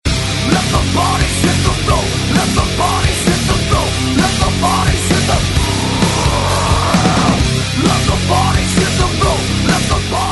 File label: win, music